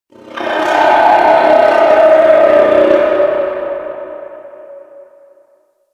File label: Infested Hive One Off; Warframe; Corpus Infested